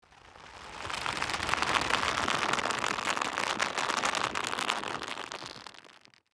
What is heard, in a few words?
Rope tighten and stretch long